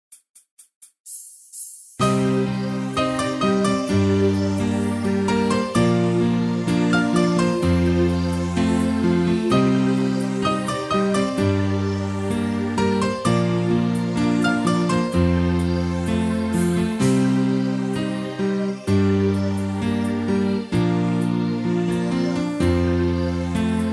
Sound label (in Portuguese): teclado playback